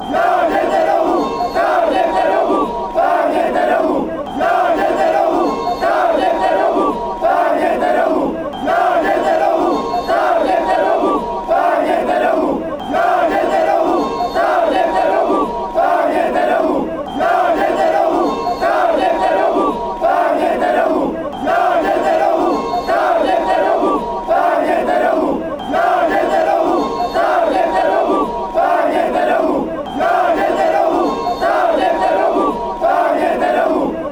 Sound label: bohemians chant